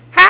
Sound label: laugh; DinningPhilosophers